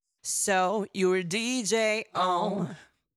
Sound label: 123BPM F